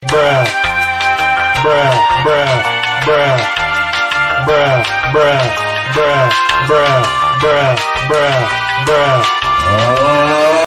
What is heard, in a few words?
elevator music bruh